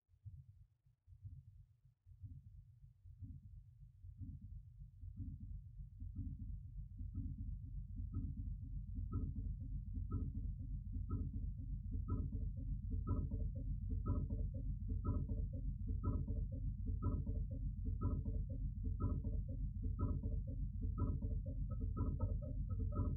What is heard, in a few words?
down in the engine room, tuba